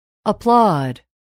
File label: applaud